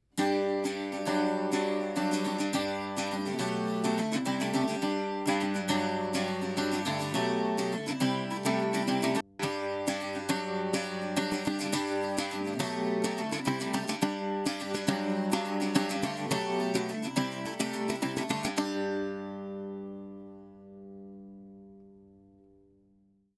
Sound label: bouzouki transient shaper dry wet; cakewalk sonar8